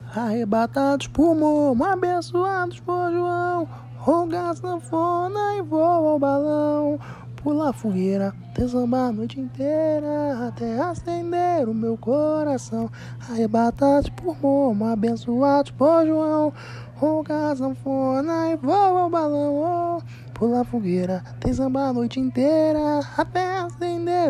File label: Samba